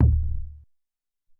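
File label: Hop Kick 4, Alesis SR-18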